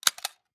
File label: mag repack finished; ACE3; Arma3; magazinerepack